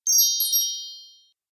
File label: Fairy Chime Spell, magic; Magic Sound Effects